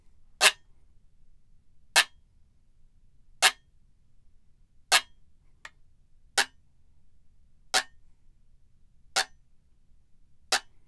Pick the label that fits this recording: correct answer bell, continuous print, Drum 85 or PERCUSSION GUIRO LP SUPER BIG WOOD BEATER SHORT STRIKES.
PERCUSSION GUIRO LP SUPER BIG WOOD BEATER SHORT STRIKES